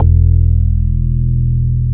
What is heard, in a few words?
WOOD BAS